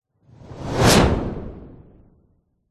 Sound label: sound design whoosh transition swoosh med powerful